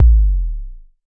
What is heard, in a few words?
Jomox MBase, drum machine; Jomox MBase 01